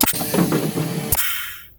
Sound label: gas load; snds jack gmod